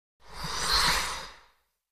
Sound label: Ice Skating Smooth